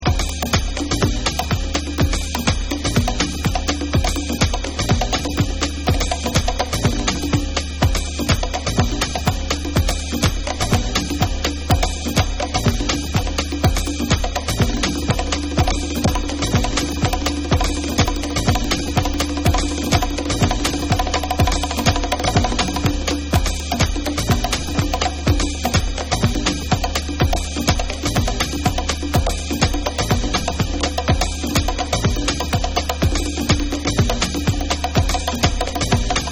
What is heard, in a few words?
SOUL DRUMS